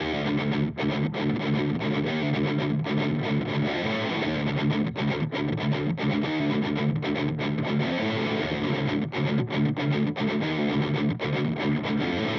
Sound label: Hi Gain Custom; HX Stomp